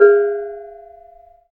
BONANG AB4F; Spectrasonics; Heart of Asia

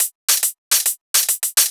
Shuffled Loops, 140 BPM; Vengeance - Essential Clubsounds Vol.4

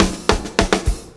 BACKBONE, Backbeat

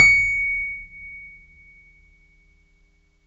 C#7 F, Piano cp80

